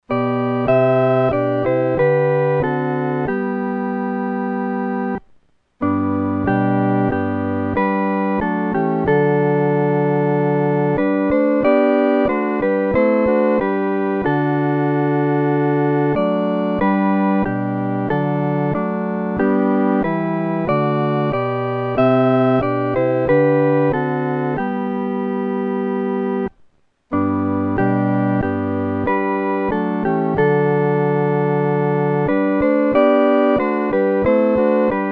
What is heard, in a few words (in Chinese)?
合奏（四声部）